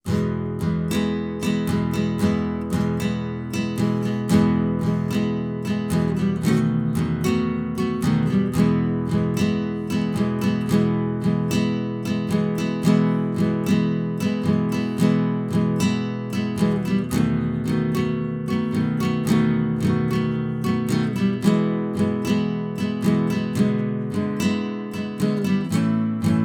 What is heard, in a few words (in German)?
Careless Love Gitarrenbegleitung